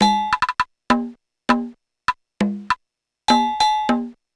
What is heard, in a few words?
Korg MR-16 Perc (100BPM); musicradar; cyborg beats